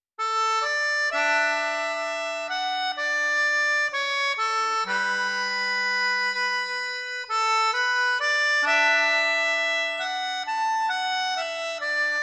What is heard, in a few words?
piano